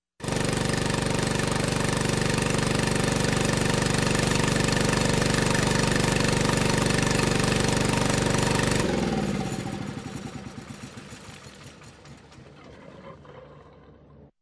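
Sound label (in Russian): работа выключение насоса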